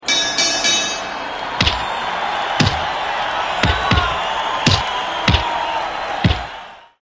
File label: Boxing Alert Tone; The Ultimate Fighter